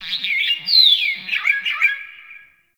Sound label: Forest Bird Mid; Ambience